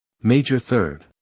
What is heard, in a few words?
major third